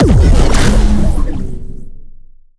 Extreme Electricity

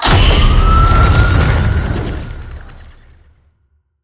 Bat Signal

